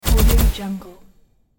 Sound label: automatic weapon shot sequence tail